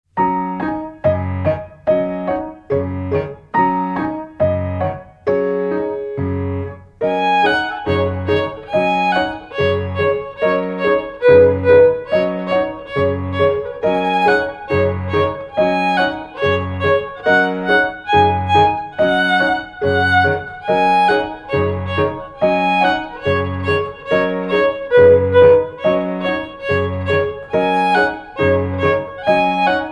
Instrumental, Violino; Violino para os Pequeninos

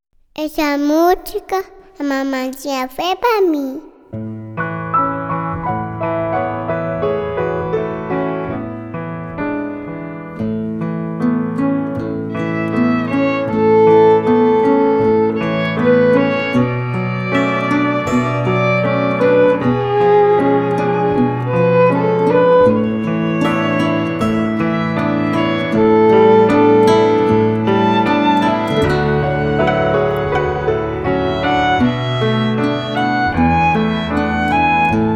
A Borboleta (Instrumental), Violino; Violino para os Pequeninos

